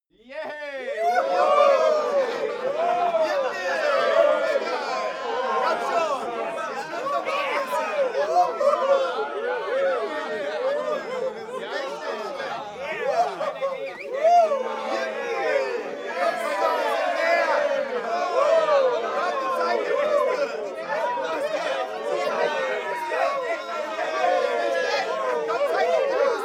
male crowd cheering

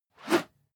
whoosh whistle fast

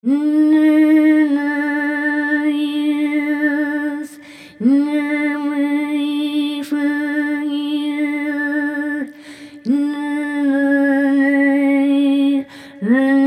girl sings strange

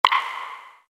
short ambient impact event

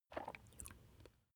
swallow food

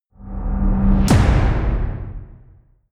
heavy drums short

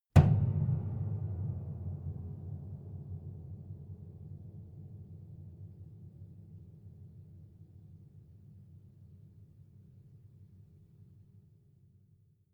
piano body hit

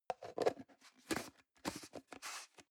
plastic can small lid screw close soft

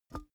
big can empty pick up

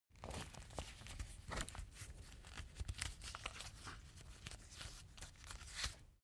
leather belt close medium

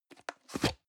plastic can small lid screw single open

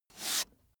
book dust off single

